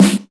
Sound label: sparkly steel snare drum g key, Royality free snare drum sample tuned to the G note.